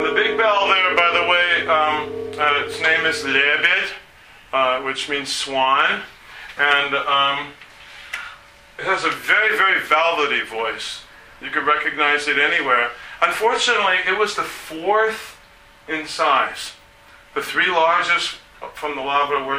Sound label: Bell Tolls